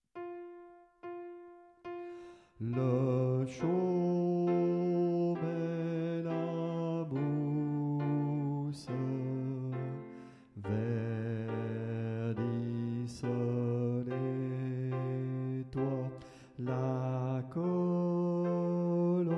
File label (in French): moulin bas, chants